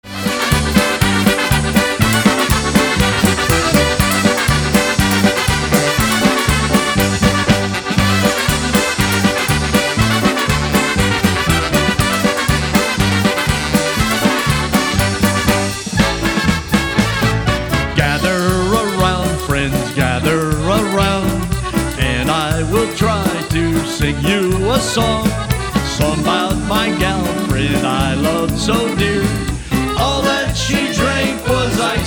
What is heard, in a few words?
Polka